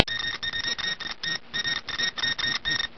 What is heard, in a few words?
Signal